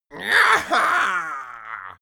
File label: imp attack